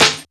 snares; Vengeance Samples

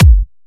126BPM Kick; VFH2 126BPM Pong Kit

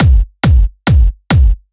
Kick 138BPM, Drums & Perc; Gminor

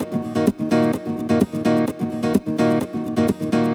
Nylon Guitar D# min, 128BPM; Vengeance Samples; VEH3 Nylon Guitar Kit 2

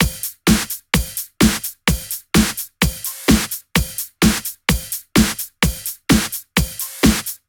128BPM Flatbeat Kit

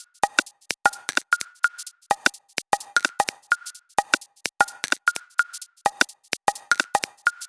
128BPM Drums; Vengeance Samples; Guess What Kit

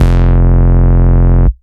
Root F, Bass

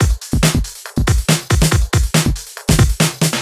Loops BB 140, BreakBeats; Vengeance Samples

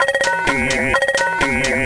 VFX2 Loop 128 BPM

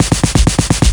Fill Ins 128BPM; Vengeance Samples

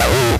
Acid Sounds H, Synths; Vengeance Samples

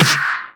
Snares; Vengeance Samples

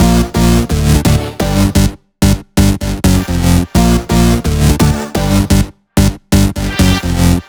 128BPM Melody; Vengeance Samples; VFH1 128BPM E Samba Kit

